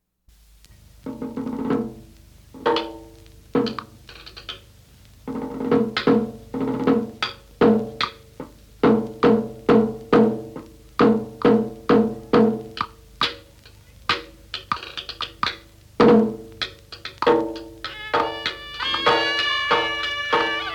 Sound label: Music of Classical Theatre; Vietnam; Nam Chien